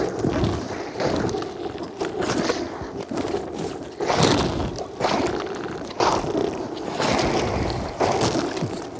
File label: SWARM ENEMY idle Loop